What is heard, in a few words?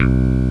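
Electric Bass (Pick) C1; X-Wing; X-Wing Patches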